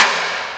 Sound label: Fairlight CMI III Noise Hit